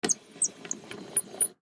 Gmc Camper Bathroom Ceiling Vent Open Twist Handle Squeak; Transportation Automobile; Car